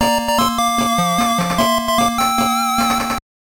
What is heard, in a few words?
Jingle; World Series Baseball; Game Gear